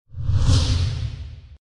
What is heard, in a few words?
Woosh Transition